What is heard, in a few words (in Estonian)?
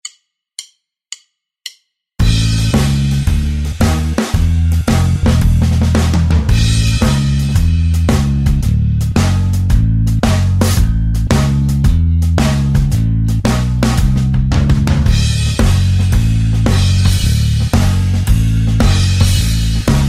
Blues rock bass ja trumm